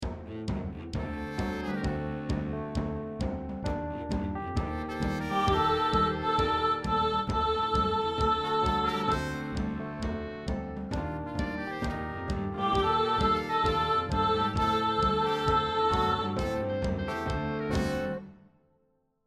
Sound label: Soprano, rehearsal